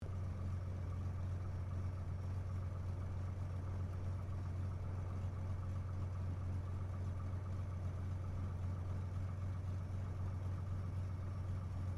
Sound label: AIRCRAFT CT4A TAXI INTERIOR; CT4 military trainer aircraft; Airplanes; Vehicles